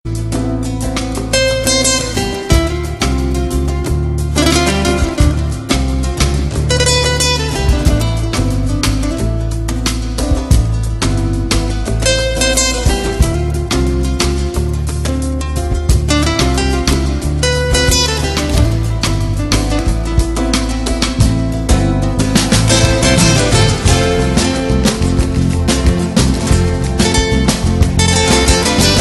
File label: gipsy guitar, ringtones, latino